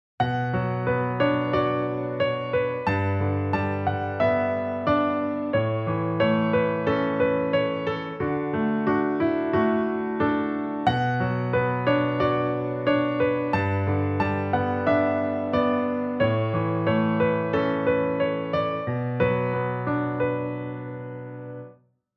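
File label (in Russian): лирический красивый грустный рассказ фортепиано фон; film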